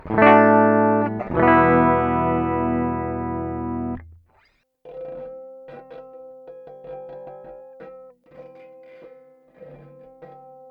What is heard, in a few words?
clean spring test